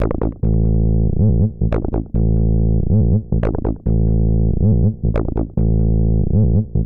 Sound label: HARD BASS 140 A, Hard Trance, Bass